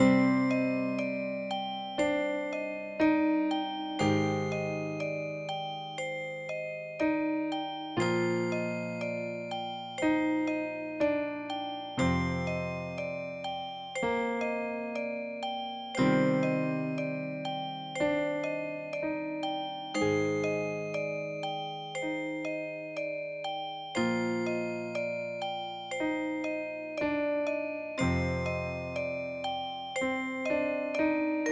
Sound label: Soundtrack bells piano; Gamejam